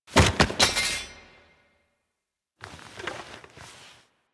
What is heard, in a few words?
Queens Chamber Foley Stunned; Teshin; Warframe; The War Within